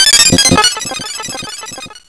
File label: error